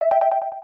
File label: Check Off; aronwk collection; ndaudio